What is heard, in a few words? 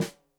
Snare Stereo, Drums; Deeply Sampled Drums